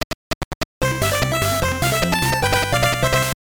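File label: Jingle, Super Game Boy; Super Real Fishing; GBC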